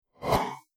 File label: Quick Rip Whoosh